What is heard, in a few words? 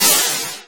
sfx sci fi; Masterbits - Switch FX